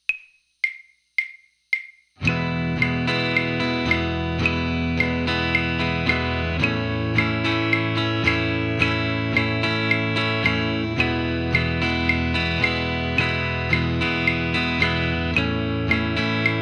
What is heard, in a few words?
practice chord